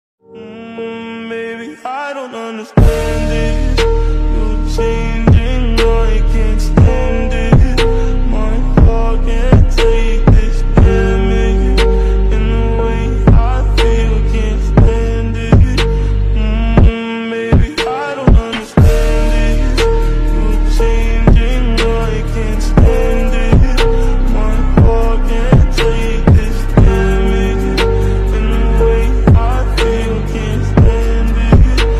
ringtone

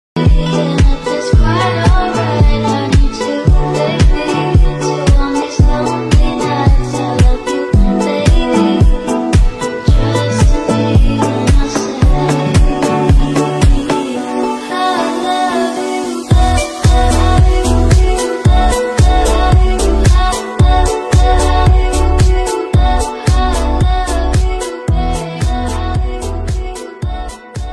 love ringtone bgm vintage

